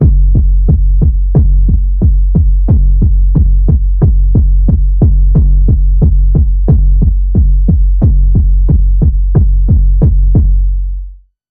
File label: Underwater Pulse Wave F sharp